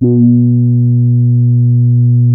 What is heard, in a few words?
TAURUS C3, synthesizer